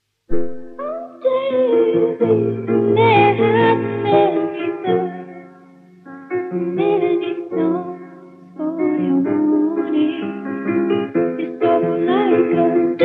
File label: humming